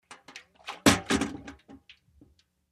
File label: Bucket Full Set Dwn; barrels buckets & cans